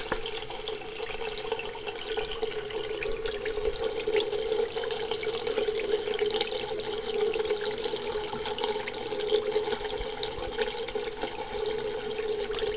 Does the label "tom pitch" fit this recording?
No